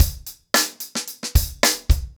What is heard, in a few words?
110BPM, acoustic drums; The Roots Drumloops